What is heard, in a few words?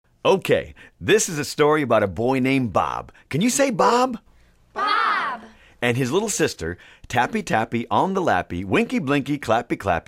tappy tappy